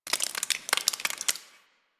Ice Thaw Drip; Ambience; Warframe

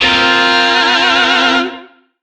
Shawzin Hirajoshi Chord I; Music; DE; Warframe; Lizzie Shawzin

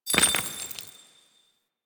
Investigate Putdown Shard; Warframe; Cephalon Weave

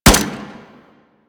Fire Mech; Warframe; DE; Weapons; Burston

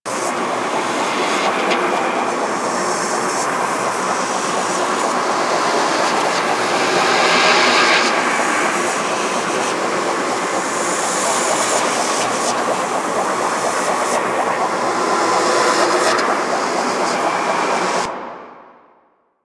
Survival Orb Loop; Warframe; Zariman

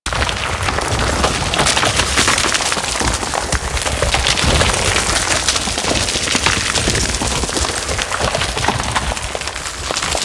Citrine Empowered Geode In Range Loop; Warframe

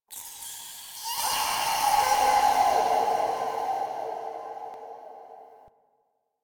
Interior Texture High Vain Squeeze; Ambience; Misc Indoor; DE; Warframe; Infested Eris

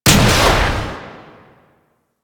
Missile Shot; Warframe; Veilbreaker; Venkra

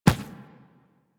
Inf Medium Run Default, Infested Footsteps; Warframe

